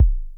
low bass, Drums, Roland SH-09

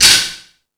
Rap Session, E-MU Pro-Cussion

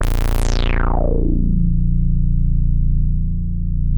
A#1, Bass; E-MU Producer Series Vol. 6 – Analogia Project (CD 1); Boom da Bass 2

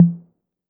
808 TH, Roland MV-8008; Roland MV8800

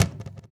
CAJON; Univers Sons - Raricussions

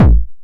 909 BD Tape Sat

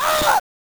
Voice OURGH; Genesis

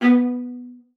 B3, VIOLA SPICC; Xsample VOL-5

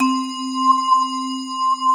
keys, Drum & Bass, 160 BPM; Ueberschall; Drum & Bass Flash Trackz

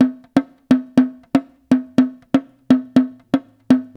JU JU, African Contemporary; Spectrasonics; Supreme Beats

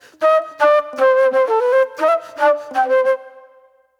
Spit Plate Flute; Sonic Foundry (Sony Creative Software); Manhattan Flutes; Session 03 - Classic Caddy

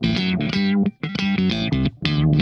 SHOT, Funk Guitar; 90 s Sample CDs; Zero G

